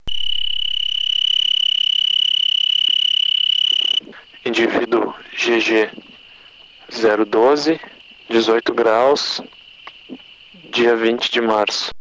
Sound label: Oecanthus pictus, 18degree Celsius